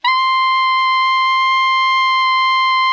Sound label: ALTO MF C 5, Sax; Greytsounds; Philip Wolfe David Crozier's Saxophones